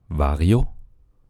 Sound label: vario